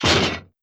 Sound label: impact; Politburo